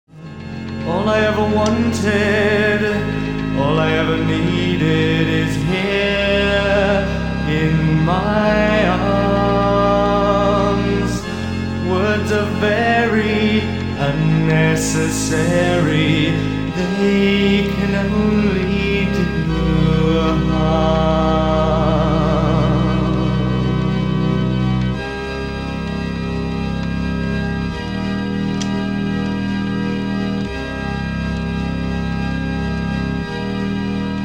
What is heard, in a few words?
harmonium